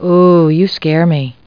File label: OOH